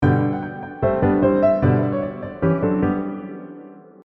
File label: 2 Layers Harmony, A melody and harmonic accompaniment; Rule of Three